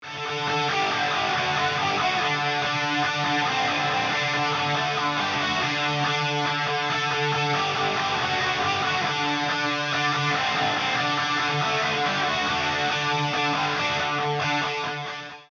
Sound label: Drive